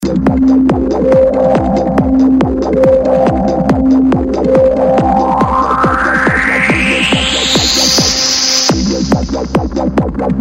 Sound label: alternate wave